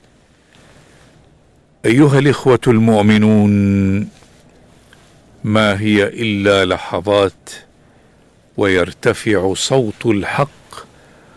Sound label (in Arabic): أذان الجمعة الثاني; bird